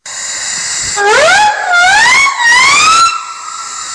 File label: steam siren